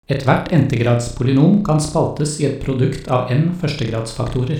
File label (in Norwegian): Kamfilter